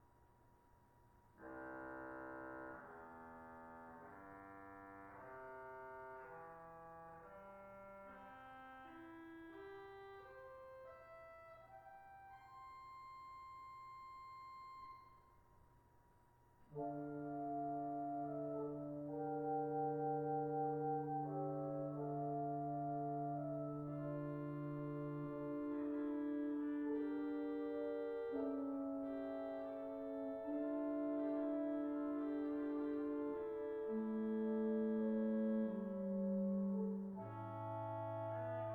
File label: positif cromhorne, organ; 16’ Cromhorne; This is one of the oldest organ stops, first appearing in the late 1400's.